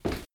Jump thud

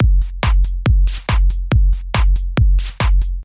Electro Drumloop 140bpm

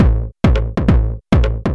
Tech loop

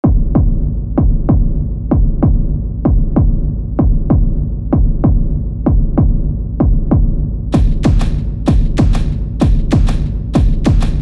Horror Promo Music

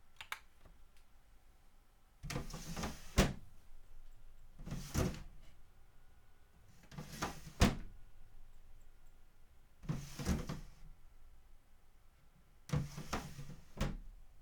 Pull Out Wardrobe Cabinet

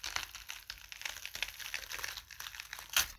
Tear Skin Gore

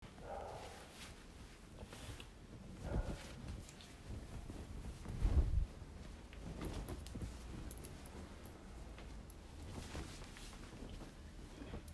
Getting Dresses

